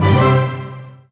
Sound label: Combo; SFX Ingame Combo 2